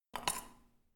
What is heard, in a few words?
Toothbrush Picking Up